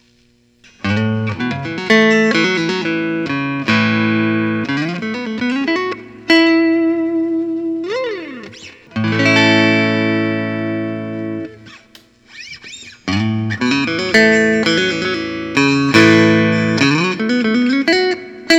fender 90s tele thinline black nitro; usa rw fb; 6 4 lbs; 1998; refin

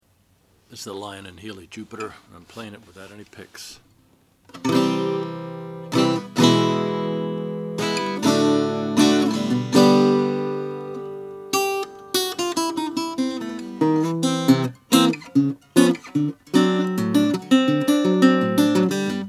lyon healy jupiter natural

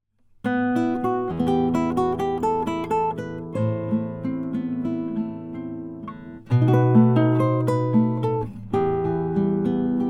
custom classical elevated fretboard, guitars; kenny hill

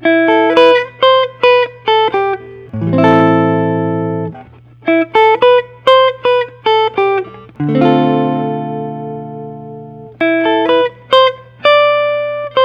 gibson nashville l5 ces; three color sunburst; wes montgomery

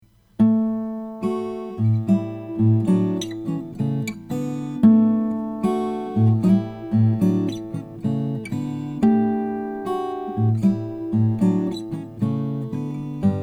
guitar m deluxe sinker; froggy bottom

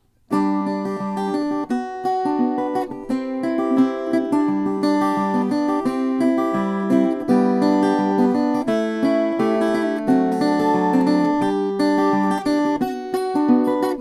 terz mahogany, guitars; veillette

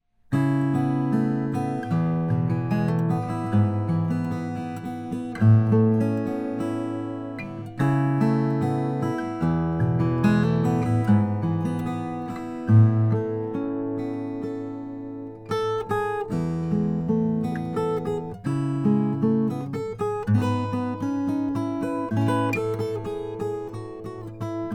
omc brazilian rosewood, guitars; zimnicki

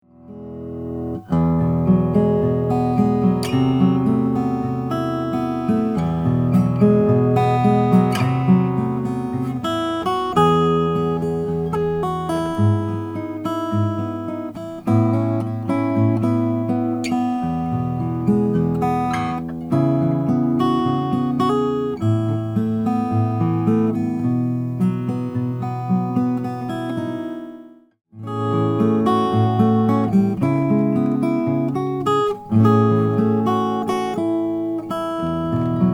taylor 612

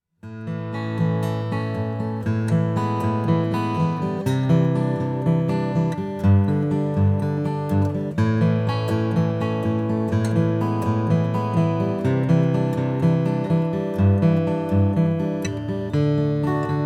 1998 ryan mission gc african blackwood

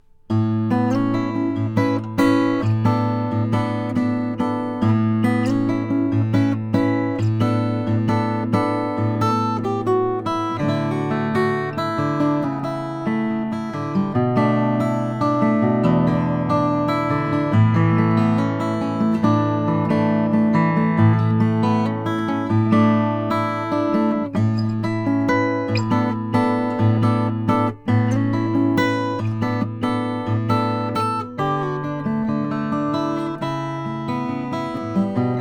2003 goodall mp 14 mastergrade cedar top

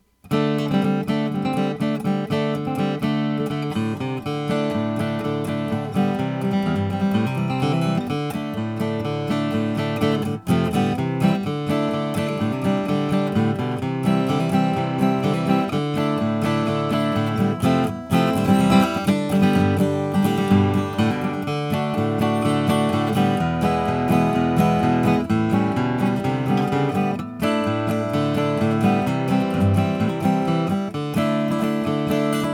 ryan mission gc adirondack top; 1998 maple

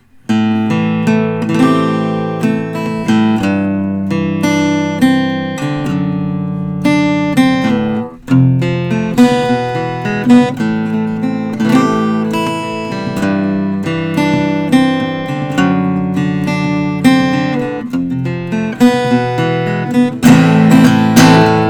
0 12 vs natural, guitars; martin custom

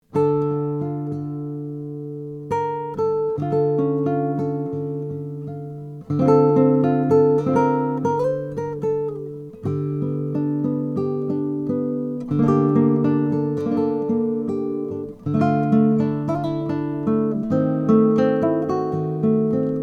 mustapick crossover concert tasmanian tiger myrt

